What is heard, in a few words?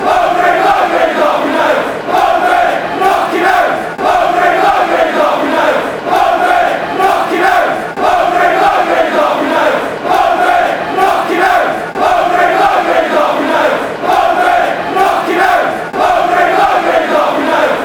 knock him out, chants; mowbray